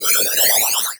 Animation Cartoon; Multimedia Internet CD-Rom Flash

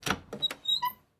Door Closet Door Open; household

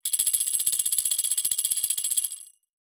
Cartoon Bell Jingle

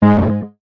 Buttons Mechanical

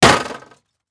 Impact Metal Bang With Rebound; Impacts

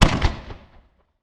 Explosion Firework Explosion Mortar; Fire and Explosions

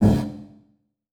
Multimedia Internet CD-Rom Flash Pop Up Short

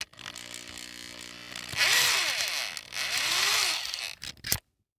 Industry Tool Drill Power Drill Remove Drill Bit

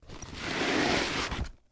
Sports Bag Duffle Bag Slide Cement

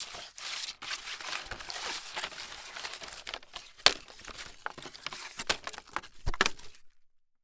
Foley Wood Gather

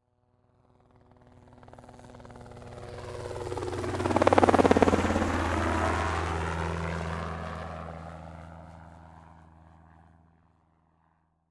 Transportation Helicopter Fly By Quickly

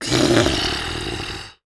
Cartoon Balloon Fart Snore; AdobeCC

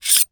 Weapon Knife Scrape Knife; AdobeCC

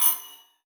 Multimedia Internet CD-Rom Flash Ding

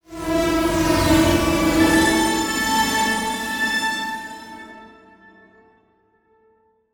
Production Element Title Transition Bed

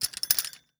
Foley Metal Spurs Spinning

